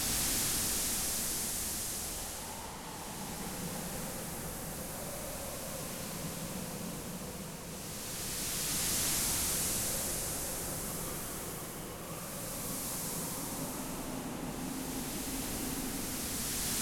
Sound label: Ambiance; Knytt